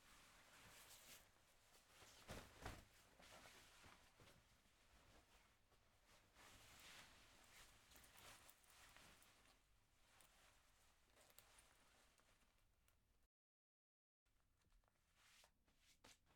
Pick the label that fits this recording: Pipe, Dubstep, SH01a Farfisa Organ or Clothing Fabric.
Clothing Fabric